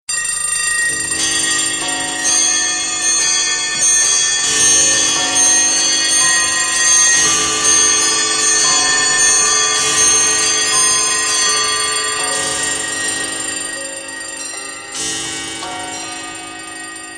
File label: big ben